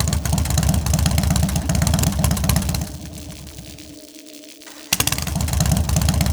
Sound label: Bike Death Idle